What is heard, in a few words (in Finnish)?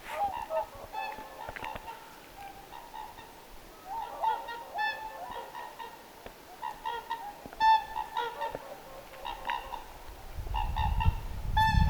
laulujoutsenparin lentoaantelya; laulujoutsenpari ääntelee lentäessään